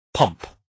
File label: pump